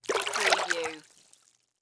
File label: Splash Bath Tub